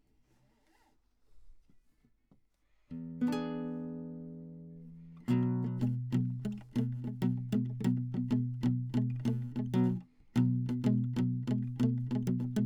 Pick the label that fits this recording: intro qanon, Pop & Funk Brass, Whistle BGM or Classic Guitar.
Classic Guitar